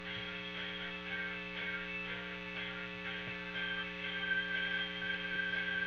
Solo Gtr

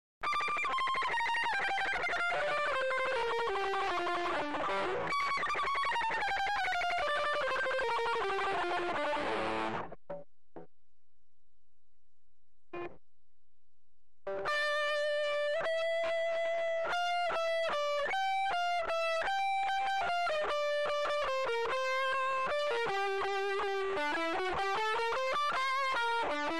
lead guitar

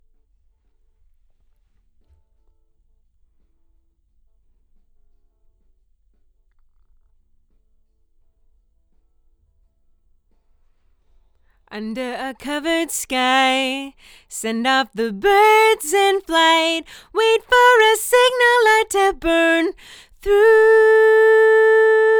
LdVOX

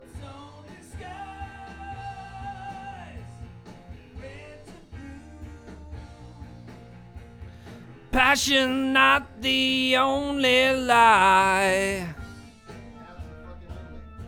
VOX Double

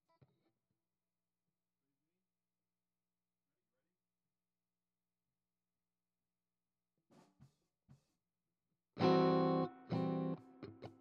GTR

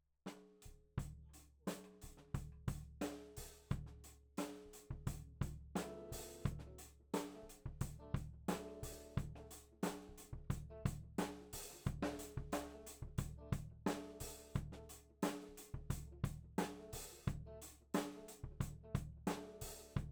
Floor

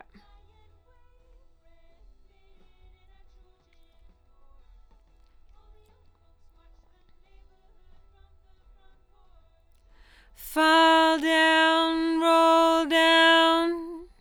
LdVOX Double

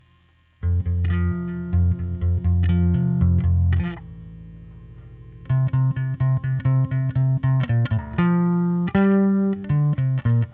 Bass OD

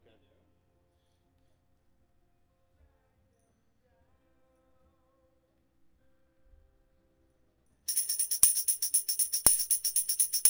Tamb

